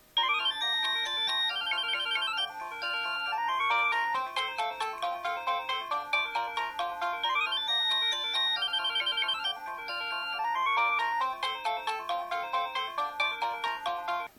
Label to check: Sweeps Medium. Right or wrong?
Wrong